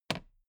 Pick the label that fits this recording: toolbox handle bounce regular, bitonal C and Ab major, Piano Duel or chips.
toolbox handle bounce regular